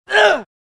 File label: Male Grunt